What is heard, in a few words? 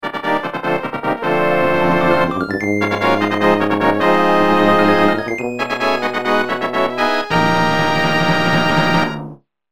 boss clear fanfare; zelda; A Link to the Past